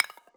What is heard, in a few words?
percussion one shot; Pop; Drums; SD PASADENA Pop Sample Pack; Slate